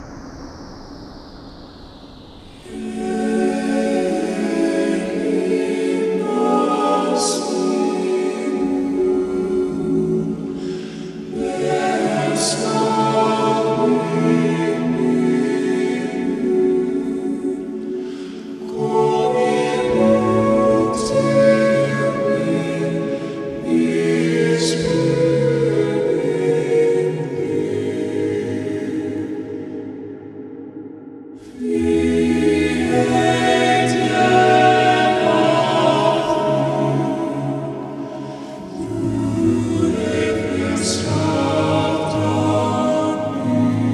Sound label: A Shattering in F# Minor Instrumental Version